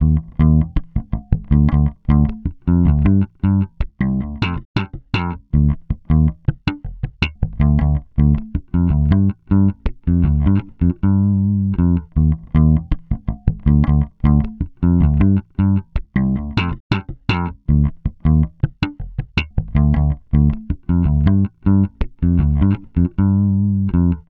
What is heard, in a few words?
Bass loop 79 Gm; Electric Bass Loops; Slate Digital BASSHOLE Sample Pack